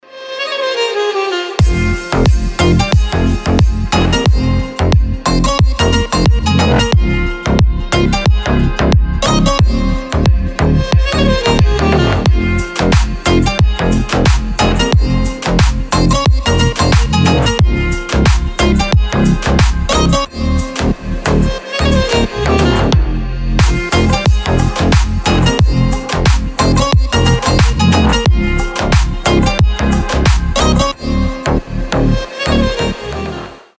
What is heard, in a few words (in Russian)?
rington krasivaya strunnaya melodiya